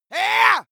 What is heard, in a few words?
male shout fight